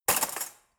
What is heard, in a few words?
cutlery fork drawer place hard